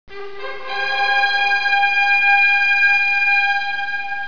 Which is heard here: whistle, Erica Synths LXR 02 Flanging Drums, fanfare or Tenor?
fanfare